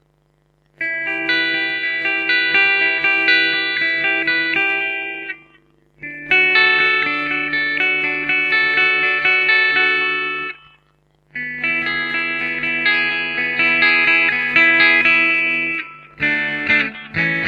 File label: Waverider 6 alnico V vintage toned single coil for Rickenbacker; Bridge; Based on the 4 & 5 string Waveriders but wound slightly brighter for extra jangle.